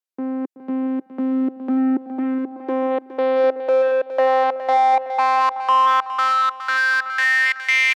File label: lead